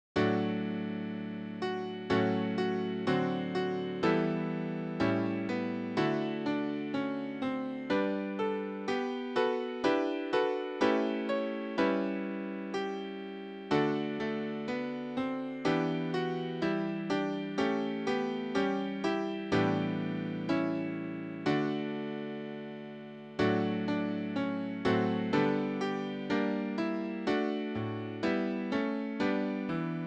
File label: easy piano; God Be With You Till We Meet Again